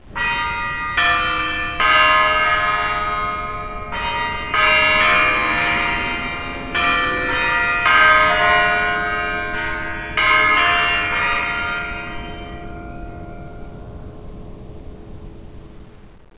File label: Big ben 8bit